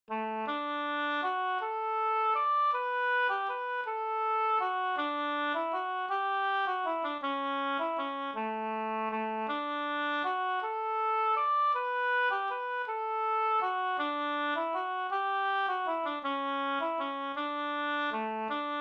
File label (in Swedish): vals brudvals